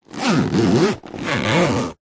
industrial power tool zip case zipper

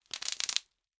foley bubble wrap pops

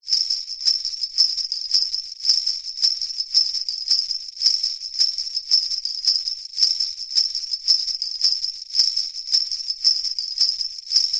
musical sleigh bells slow shake

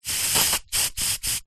household aerosol can spray; zapsplat

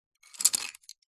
office pencils handful pick up from concrete

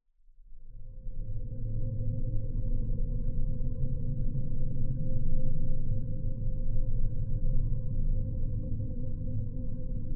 science fiction drone deep dark tunnel; zapsplat

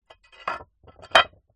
terracotta roof tile movement on top of other; foley; zapsplat

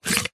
plastic cones stacked pull apart

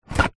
vehicles scooter moped seat lift up open

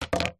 household hairbrush set down wood floor

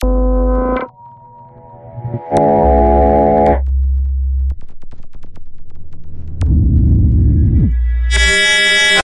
tonescape; gregor quendel

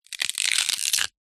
horror bone break twist; zapsplat

